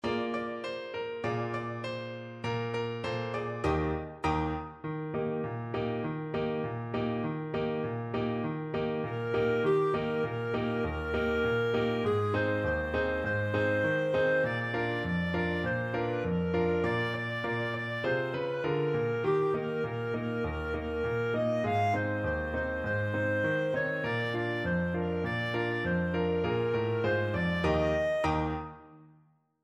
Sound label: knees up mother, clarinet